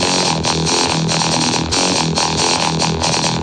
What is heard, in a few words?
vocoded death metal, guitar loops